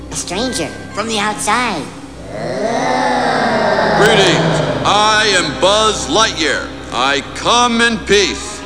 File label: little green alien